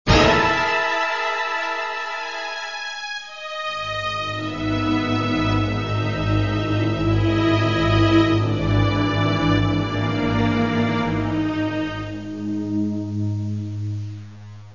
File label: Scary Music